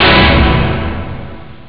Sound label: old hit; Amiga Soundtracker Sample Packs